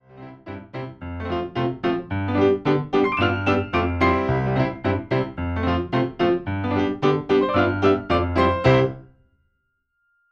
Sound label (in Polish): Idziemy instrumental bez linii